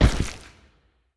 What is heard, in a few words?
movement step; smite; god odin